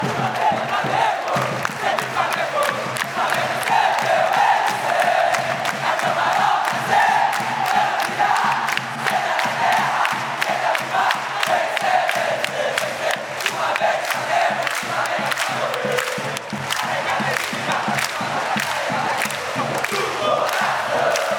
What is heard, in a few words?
hino fan chants; do flamengo